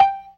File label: PERC, Synth and Organ